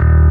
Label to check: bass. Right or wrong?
Right